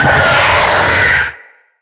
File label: Spider Vocal Attack Short; Vocals; Root Spider Mother